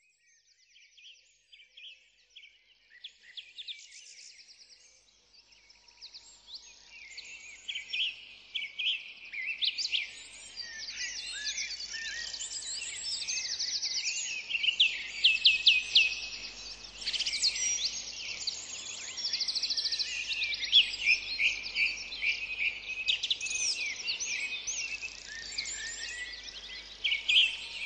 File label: Irish Birdsong